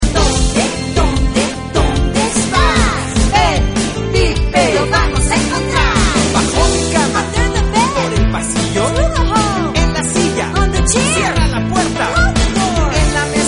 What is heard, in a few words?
pipe